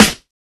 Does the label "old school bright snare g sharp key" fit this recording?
Yes